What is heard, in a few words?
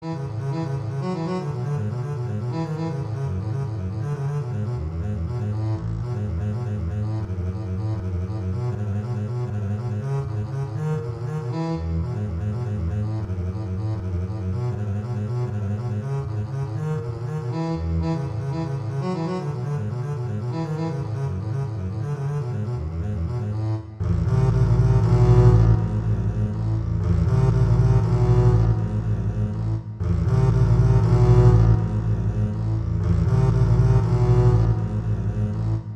Waltz; Dragonetti; Waltzes